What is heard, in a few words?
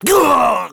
dead male; character